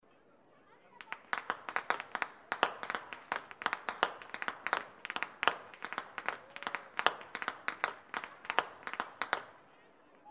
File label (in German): schnalzen, jugend